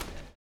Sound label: STOMPS; HOW IT IS; Spectrasonics; Vocal Planet; Blues Soul